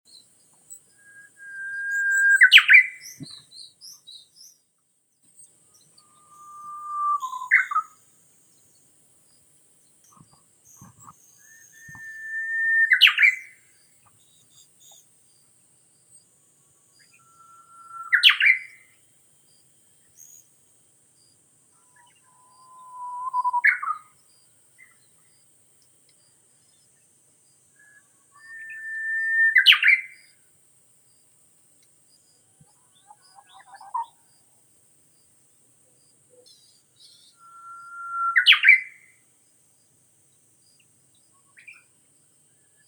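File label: bamboo warbler, Cettia diphone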